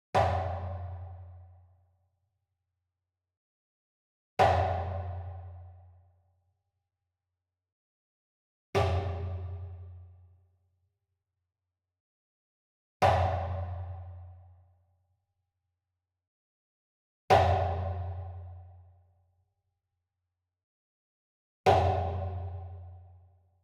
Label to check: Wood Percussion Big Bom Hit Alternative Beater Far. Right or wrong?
Right